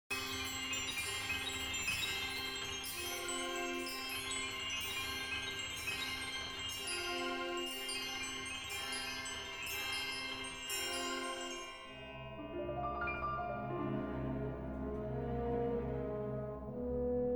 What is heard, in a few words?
Piano Concerto; Hakola Kimmo